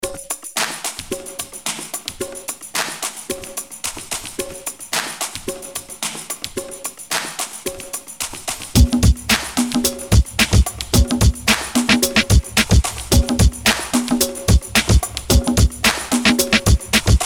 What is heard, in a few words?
Background Game, ambient industrial